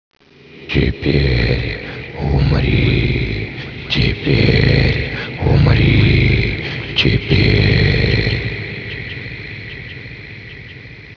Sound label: zm idle; cstrike; Umbrella